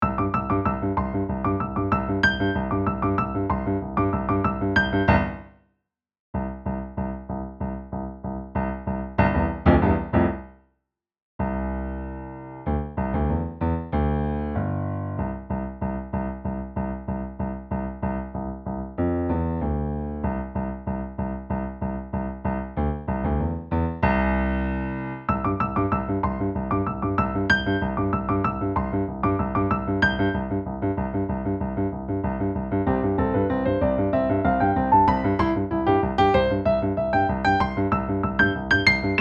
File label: fly a kite instrumental